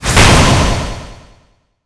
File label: New Icegab Target